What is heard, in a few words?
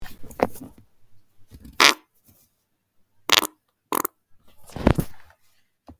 nasty fart